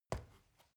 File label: step ladder wood single